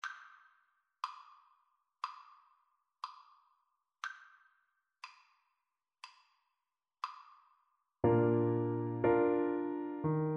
romance, Clarinet; beethoven op50

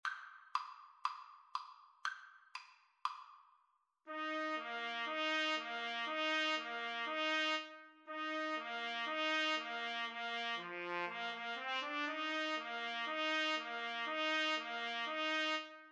baltimore belle 2 trumpet

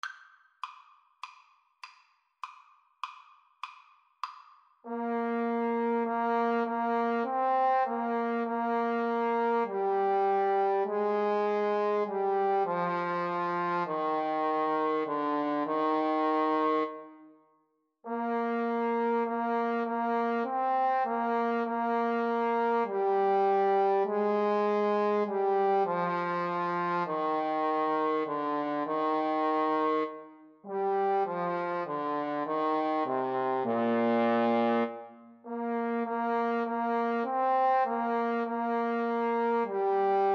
Trombone Duet

